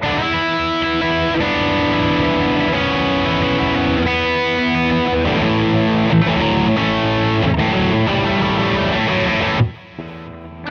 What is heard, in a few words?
Interlude Rock E; Loops